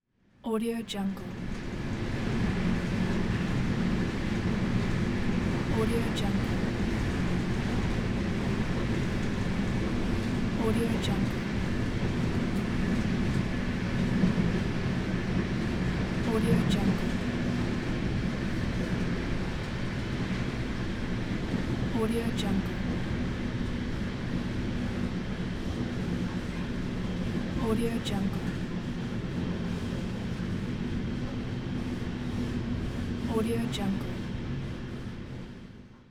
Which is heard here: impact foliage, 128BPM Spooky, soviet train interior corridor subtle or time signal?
soviet train interior corridor subtle